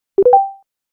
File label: ColorOS Charging Sound; ColorOS 1.35